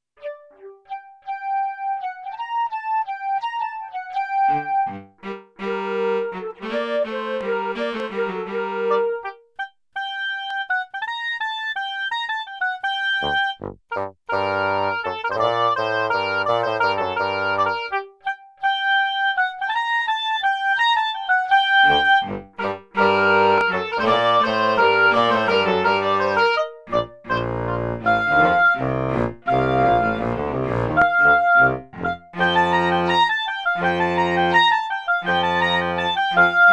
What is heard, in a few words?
Solo Cello Flute Bass Clarinet Clarinet Bassoon Oboe song